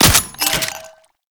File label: Alt Fire Mech; weapons; Scaldra AK